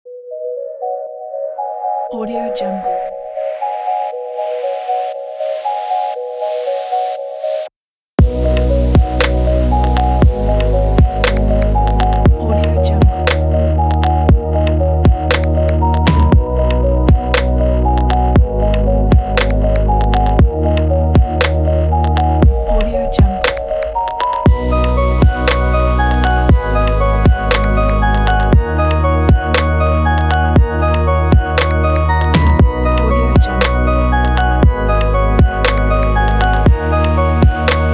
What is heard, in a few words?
inspiring abstract